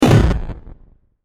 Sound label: LoFi Explosion; Drums; Musical; CraftedSignals